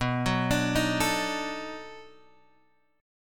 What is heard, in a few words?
B 7th Sharp 9th B; B7#9 Chord {7 X 4 7 4 5} arpeggiated